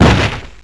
thud

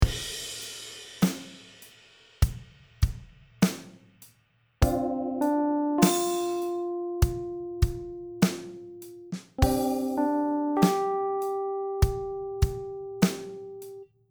Sad Song (Without SFX)

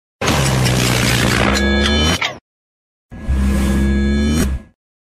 time stops and resumes

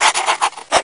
scrawl

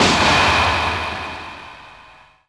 Wahhhhh

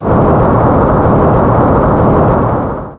earthquake

